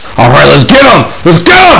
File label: yell; hassault